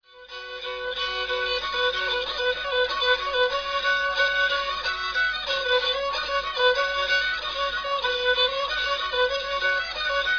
rabel; tradiberia

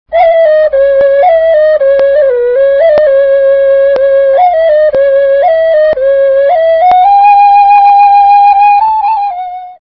ocarina; tradiberia

